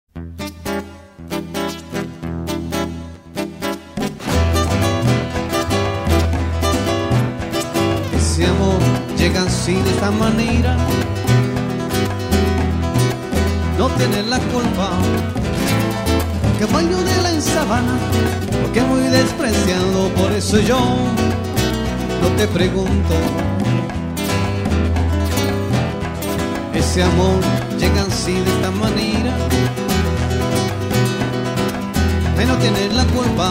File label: South American Sound